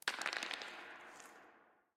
crumble, Mines; AMB; OneShot Fading Memory

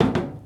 step metal soft; OneShot Fading Memory

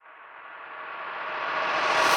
fade in; OneShot Fading Memory

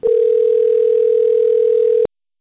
phone outgoing; OneShot Fading Memory

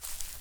step grass; OneShot Fading Memory

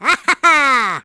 AHHAHA; Rabbit-Wars

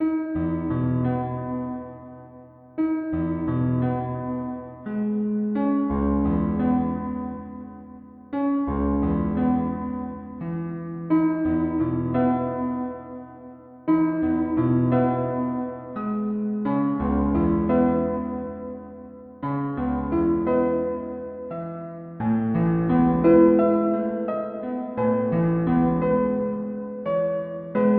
piano

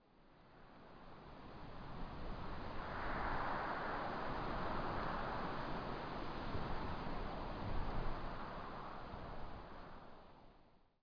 winds

